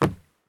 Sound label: landing wood; Escape from Tarkov